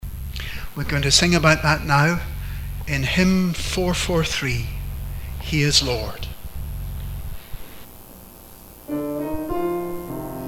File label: hymn; easter morning